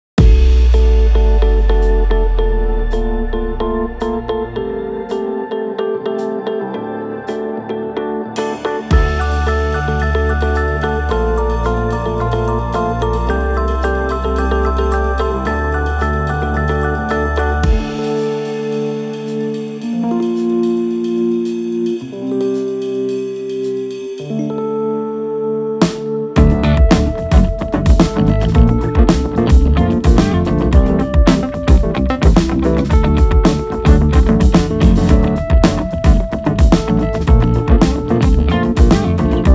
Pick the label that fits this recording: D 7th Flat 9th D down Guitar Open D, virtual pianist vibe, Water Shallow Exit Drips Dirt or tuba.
virtual pianist vibe